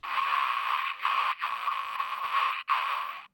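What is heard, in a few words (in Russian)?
жуткая сломанная игрушка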